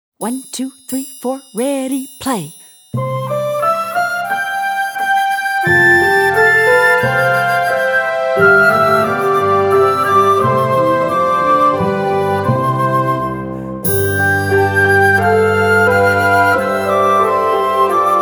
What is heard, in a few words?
Twinkle Variation